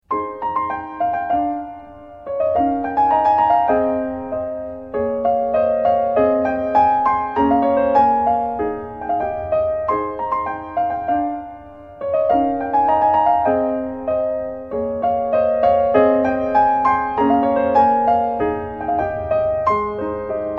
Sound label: Piano Pieces SF2